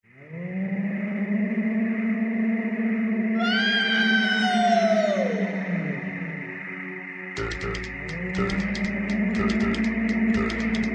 Disco Track; Smile Please 2004

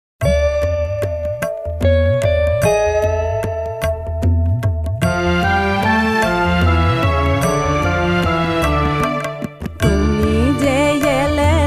Sad Song; Bhooter Bhobishyat 2012

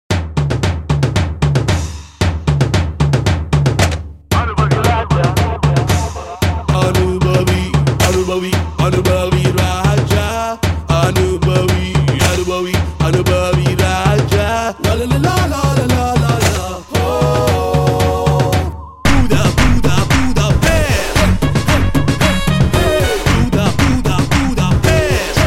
Beat; Sevarkodi 2012

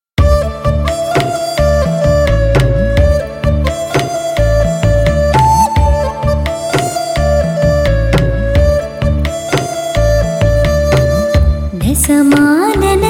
Female; Gowravargal 2010

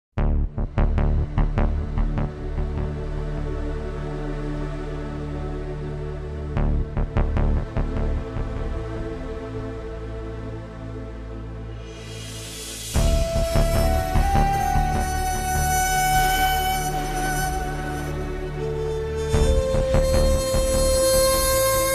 Instrumental; Kokki 2006

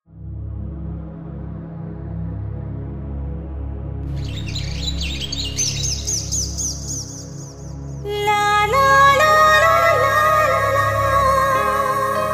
Humming

